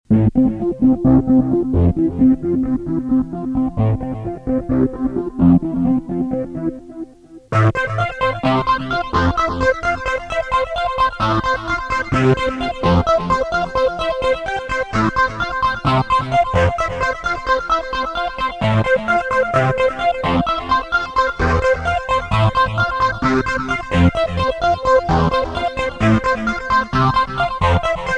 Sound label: ARP